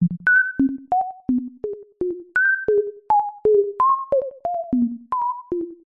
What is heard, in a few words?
synth theremin robot talk beeps